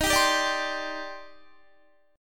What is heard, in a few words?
D sharp Major 13th, down, Guitar; strummed; JGuitar